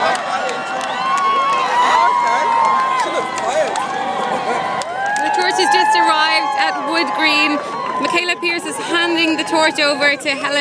torch arriving; woodgreen

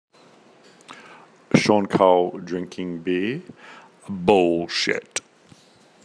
drinking beer

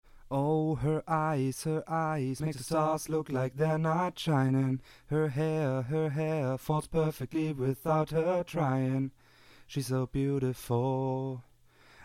short akustik acapella

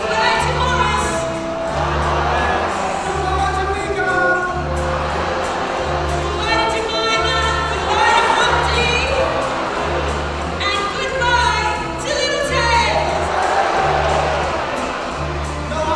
jazz, Melbourne town hall

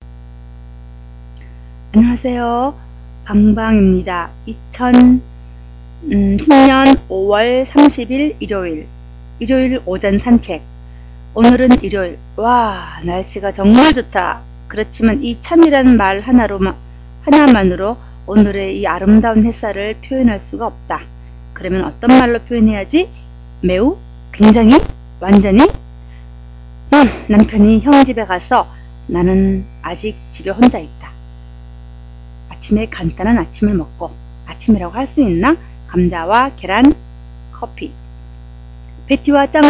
Korean Sunday morning taking a walk

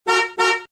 the horn

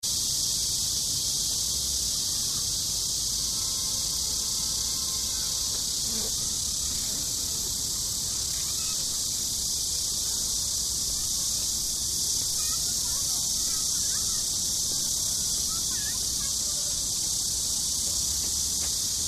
cicadas chengdu

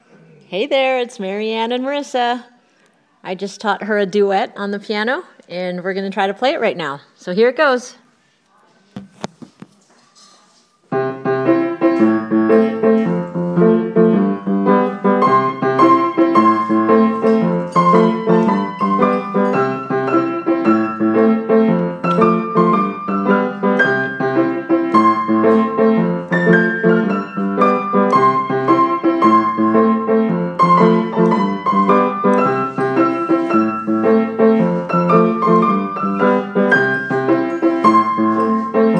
me on the piano

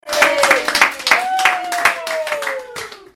applause

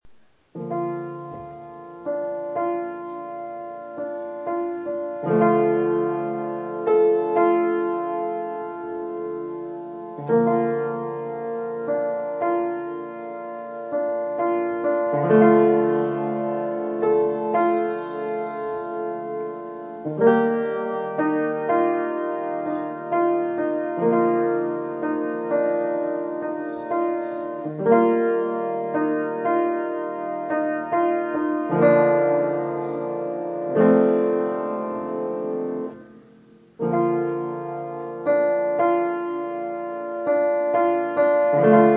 piano

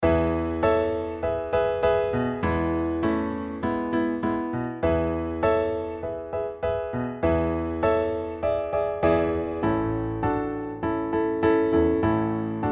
violins zoo

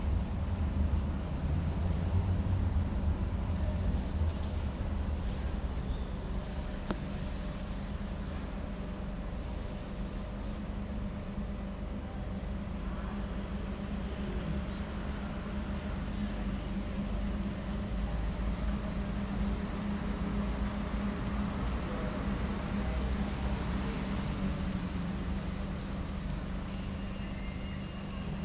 outside Sheffield Cathedral

